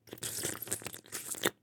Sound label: glue slurp